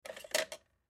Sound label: Pen Remove From Cup Container Pencil Pick Up; Pen Sound Effects; Offices